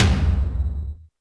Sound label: Yamaha RM 50 Toms